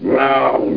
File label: cat die